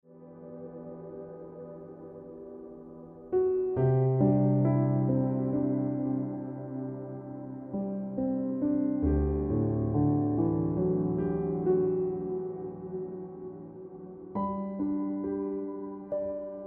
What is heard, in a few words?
Ringtone Piano Music